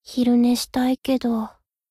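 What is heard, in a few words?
attack; hina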